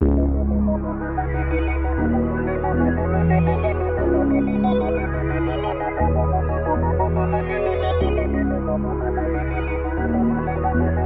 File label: 120 BPM C# Minor, Hip Hop Melodies; Neptune